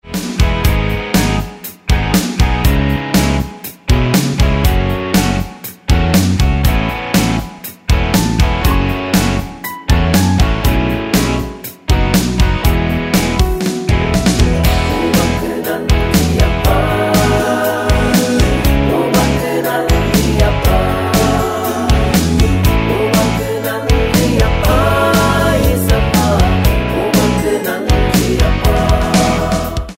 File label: Karaoke